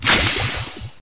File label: reload poison Chamber